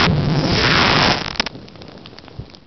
beam start

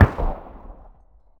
distant

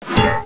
pipewrench big hit; hl1 weapon

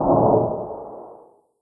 shoot distant; hl1 npc; hassault

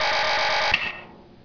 reload; prdroid

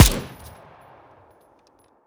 negev; arccw go

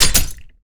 sensor land, sensor grenade; arccw go

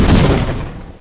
explode

